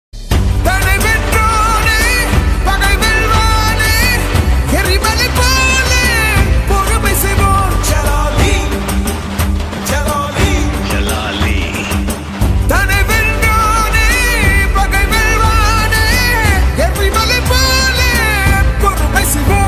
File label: song ringtone, bgm ringtone; lal salaam